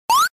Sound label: item out; Mario1-1